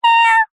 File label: animals cat kitten meow; zapsplat